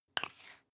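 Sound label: toothpaste close twist hard, Bathroom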